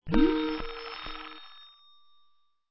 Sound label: Incoming Call; skype